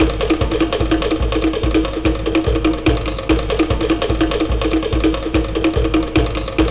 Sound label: WAR DRUMS; Zero G - Ethnic